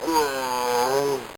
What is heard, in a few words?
enemy death, bitcrushed voice one shots